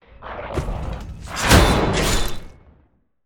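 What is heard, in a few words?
dark knight attack special, monster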